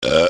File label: Burp